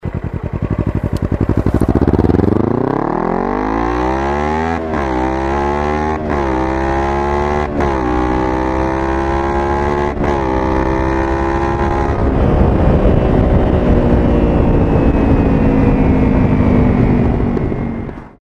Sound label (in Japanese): ninja250 kasoku